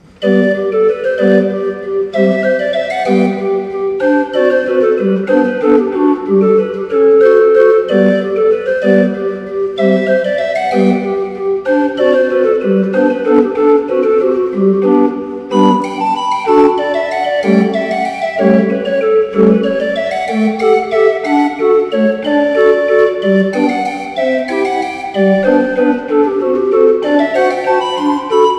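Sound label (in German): menuett, spieluhren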